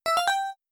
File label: correct